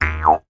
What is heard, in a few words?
Jews Harp Funny Boin, cartoon; cartoon fx